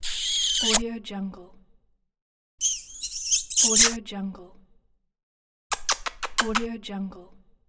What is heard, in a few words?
kiss